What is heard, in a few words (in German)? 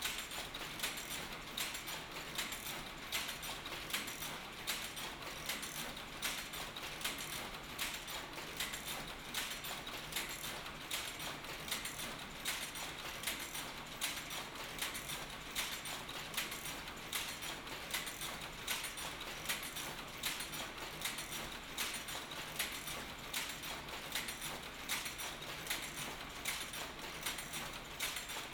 Webstuhl; Lexikon-Roman